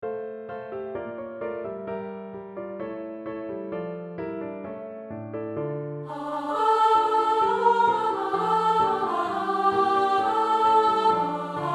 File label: Alto; Kyrie